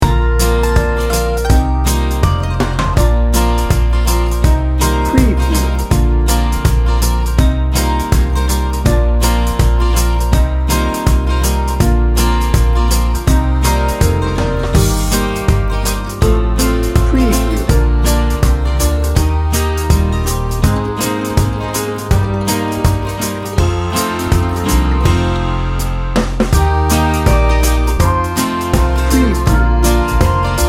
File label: americana folk pop upbeat